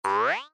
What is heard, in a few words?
cartoon jump